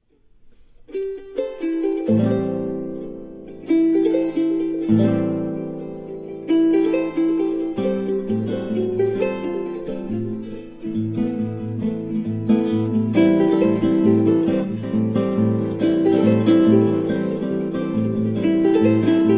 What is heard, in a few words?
charango with others